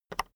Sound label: keyboard external enter release soft